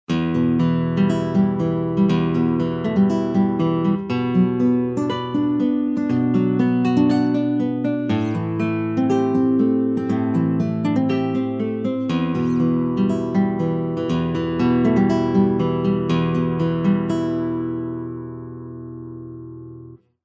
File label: nylon finger; acou6tics